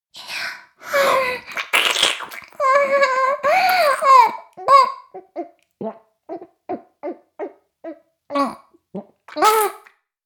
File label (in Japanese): フェラ音声; おにいちゃんアイスぅー！; 妹